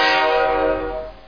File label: connect; PCGamer50; Bonzala